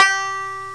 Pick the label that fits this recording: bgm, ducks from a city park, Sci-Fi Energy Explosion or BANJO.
BANJO